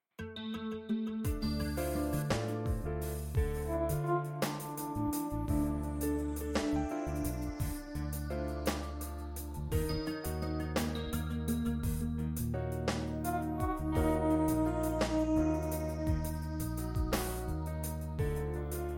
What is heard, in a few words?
music; bola8